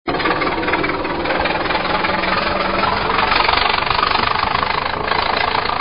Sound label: early car engine vana ford